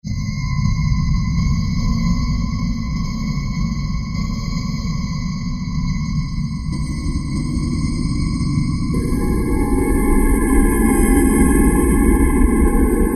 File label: Roland PN-D50-04; Twilight Zone